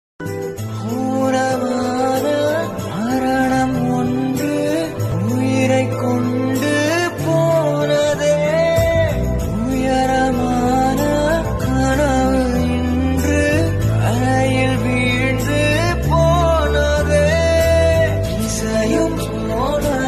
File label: song singing; heart melting